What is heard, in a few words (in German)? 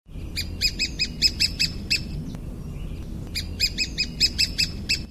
kleinspecht